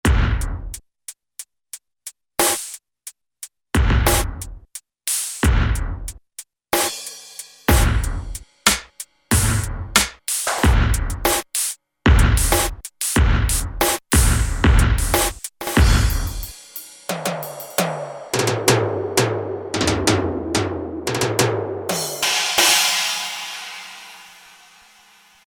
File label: Video Kit; Roland L-CDX-01 Rhythm Section Instruments